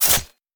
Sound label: Dagger Hit Turn Around; we are the dwarves gamerip 2016